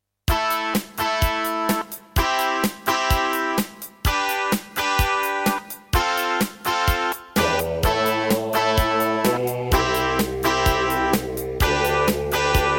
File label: Roland SC-88 55MAP; raptor call of the shadows